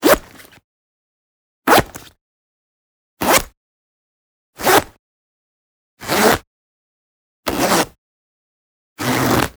CLOTH Foley Jacket Synthetic Polyester Zip Ties Zip Unzip; Systematic-Sound; Sound Themes - Modern Cloth Foley 01